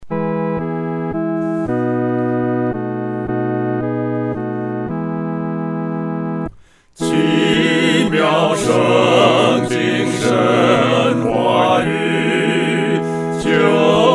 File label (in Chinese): 合唱 四声部; 奇妙圣经